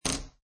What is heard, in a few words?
Poker Chips Place Stack Of Chips On Another Stack Wood Table